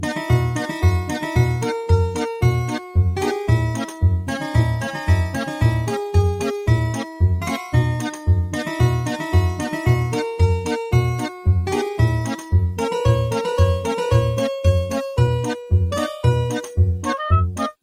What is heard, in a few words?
Circus; Zelda; CannonG